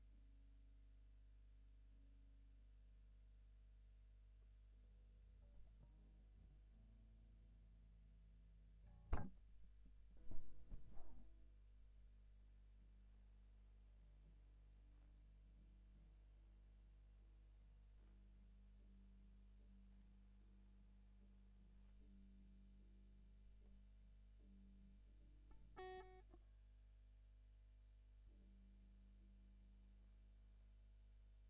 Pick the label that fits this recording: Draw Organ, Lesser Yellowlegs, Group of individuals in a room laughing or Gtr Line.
Gtr Line